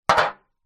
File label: drink can small amount liquid inside down on table